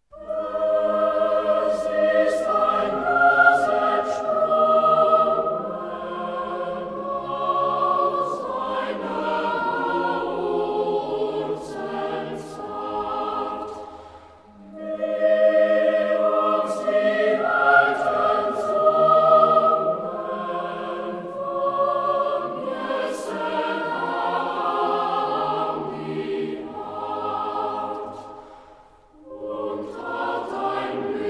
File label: Cathedral Choir